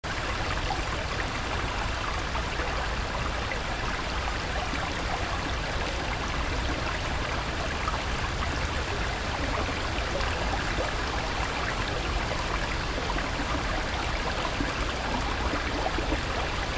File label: bg noise